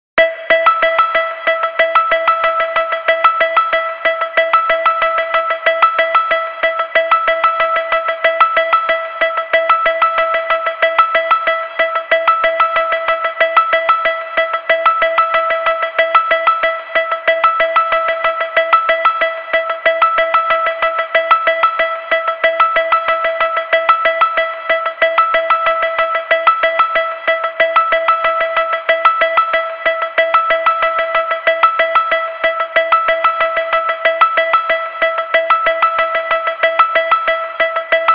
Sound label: groove